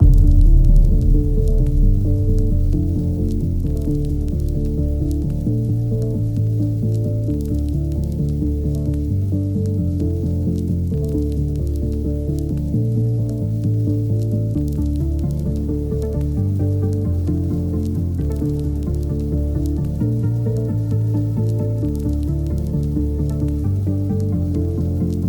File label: Instrumental version